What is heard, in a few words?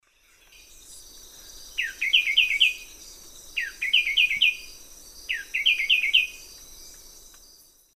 Henicorhina leucosticta, song; S. America; Eastern Lowlands